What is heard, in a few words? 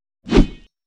sword; Weapon